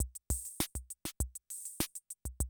CR78, DrumLoops

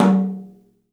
TOM, Studio Toms; 3D Percussives

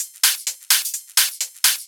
Hihat Loop 128 BPM

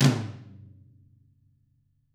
TAMA TOMR, flam; ILIO; Double Platinum Drums 1

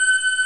D50 Layer, Roland

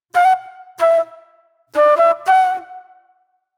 Mellow Flute; Sonic Foundry (Sony Creative Software); Manhattan Flutes; Mixed Berry Jam

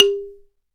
BALA, Balaphone; Roland LCDP11 Africa VOL-1; Bala & Xylo

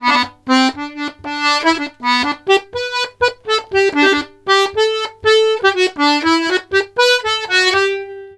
POLKA, Accordions; USB Soundscan vol.40; AKAI

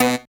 synth click, dance synth; Sound & Vision - Gigapack I CD 2 (Roland)

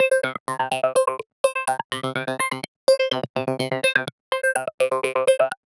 synth 125 faze C; Transmission-X

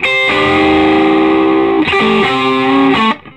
SOLO, Vintage Blues Guitar

